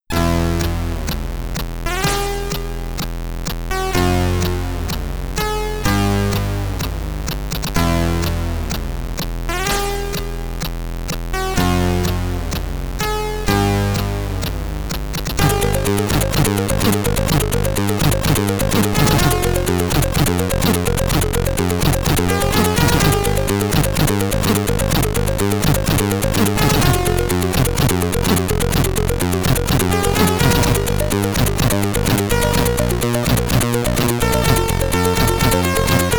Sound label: Opening (SID Stereo); Alien Syndrome; C64